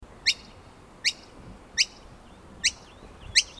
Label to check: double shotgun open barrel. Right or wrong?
Wrong